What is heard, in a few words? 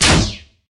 impact, weapons